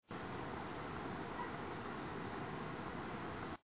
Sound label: bedsprings